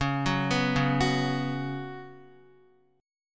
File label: Db 7th Suspended 4th Db down Guitar; JGuitar